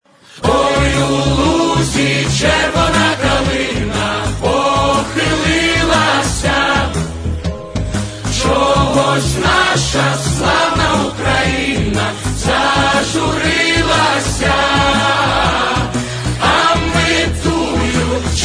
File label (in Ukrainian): Ой у лузі червона калина (Хор)